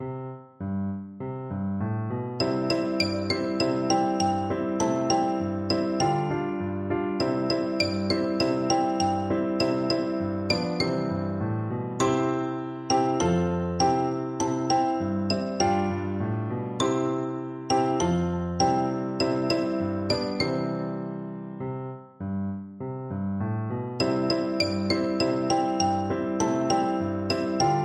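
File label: liza xylophone; percussion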